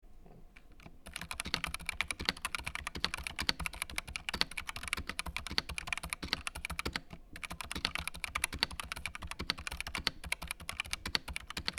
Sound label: typing; YELLOW